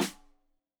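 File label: Snare Stereo, Drums; Deeply Sampled Drums; SMDrum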